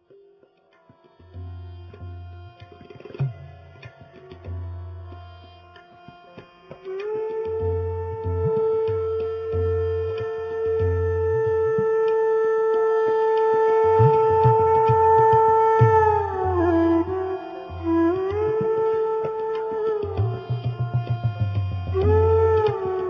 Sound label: Late Night Melody Raga Lalita